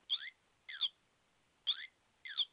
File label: ericsson t39 ringtones